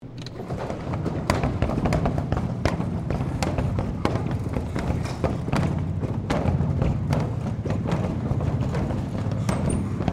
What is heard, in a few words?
Door Rollerdoor Large Supermarket Closing Stereo, Large supermarket roller door; Miscellaneous Doors